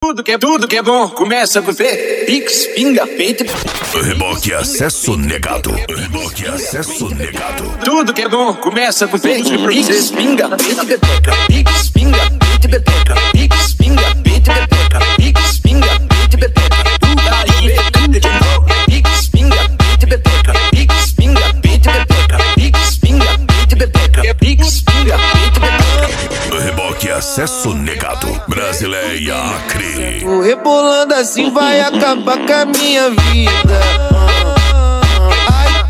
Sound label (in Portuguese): racha eletronica e funk mega bass